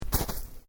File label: Footsteps Boots Snow Step; Footstep Foley; Human